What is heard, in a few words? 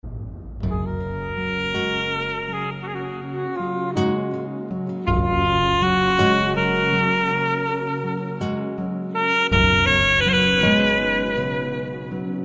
Instrumen